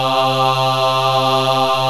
MALE CHOIR

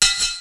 REVERSE DRUM

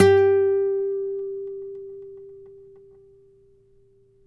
NYL G, FLAMENCO NYL; Spectrasonics; Hans Zimmer Guitars VOL-2

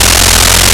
Digital Noise, wavetables; Animoog

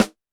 Snare, Roland TD-20

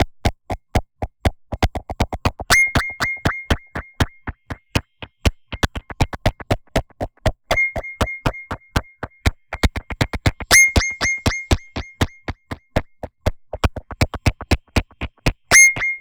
Structured Rhythm, IDM Rhythms

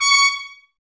BRS TPS Mute; Miroslav Vitous; Woodwind Brass Ensemble

